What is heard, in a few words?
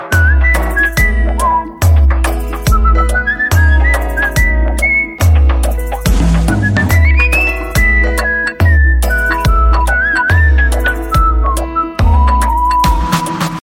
Unique Whistle Tone